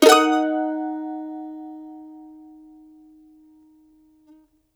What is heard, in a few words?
CEE CHORDS D#M, Blues Guitar Samples